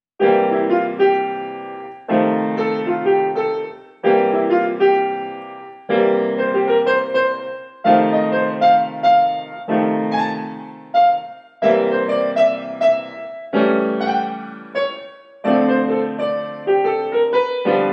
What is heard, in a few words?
blues improv example